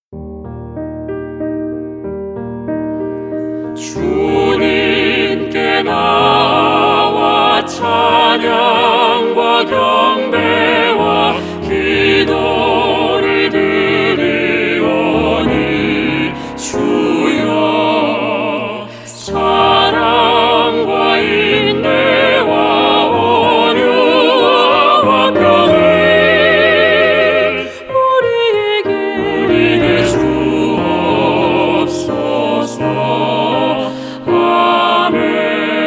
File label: CHOIR